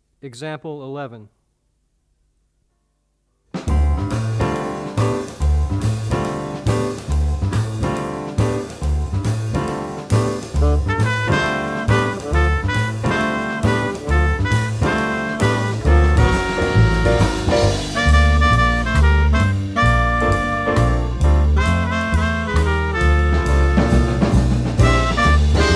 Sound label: two horn audio examples